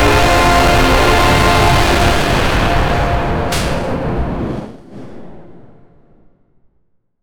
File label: asteroid destruction; ceres